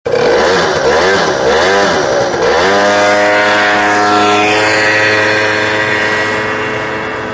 vehicle start

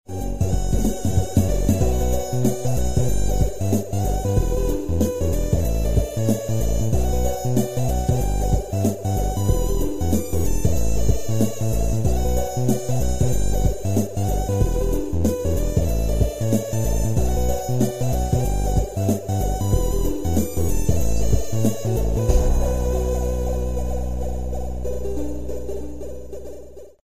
keygen ringtone

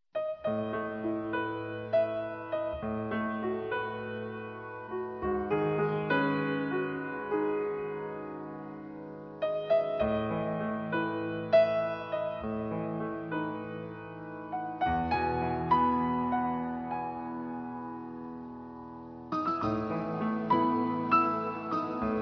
night nocturne ringtone

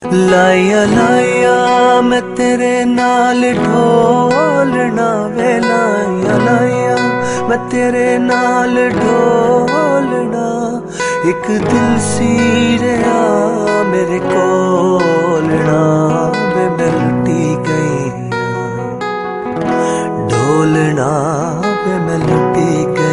sad song ringtone

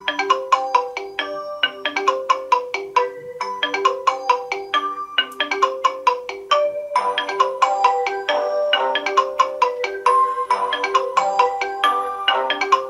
xiaomi vigor redmi ringtone

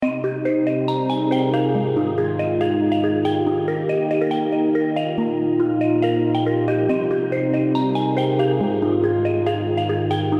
soft refreshing background music tone ringtone

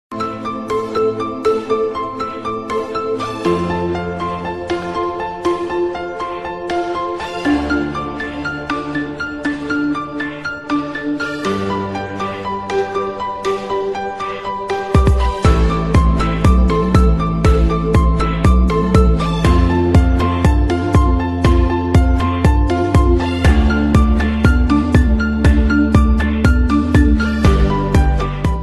lovely ringtone

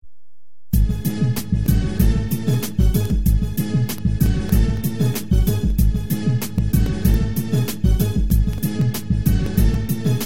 organ ringtone

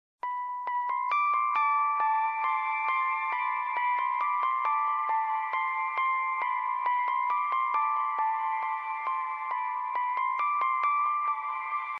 soft message tone ringtone

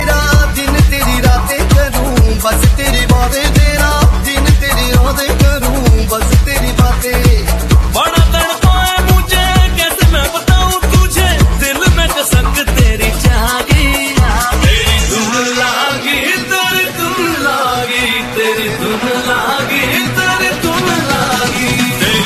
remix hit ringtone

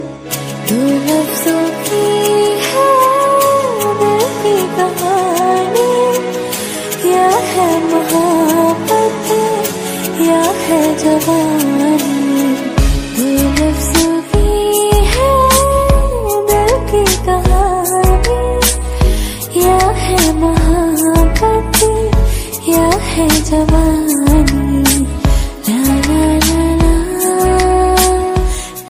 female version love ringtone, bollywood

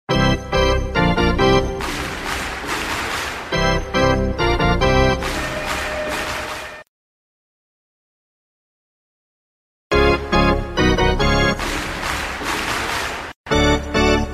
sms cool sports ringtone

